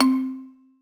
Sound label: marimba